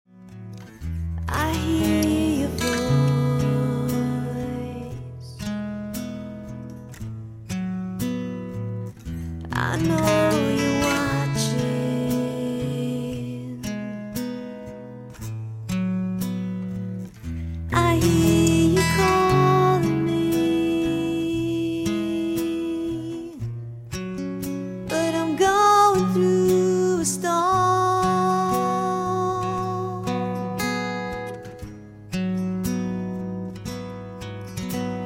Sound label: singing Rock Pop